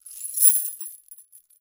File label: CHAIN Drop